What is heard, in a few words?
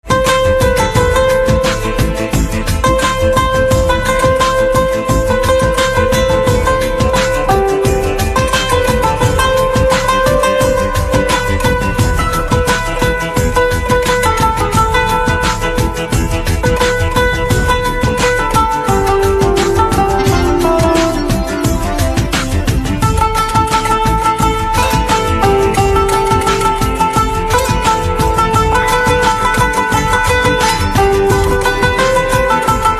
Guitar